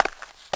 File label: CONCRETE, FOOT STEPS; AKAI S6000 CD-ROM - Volume 1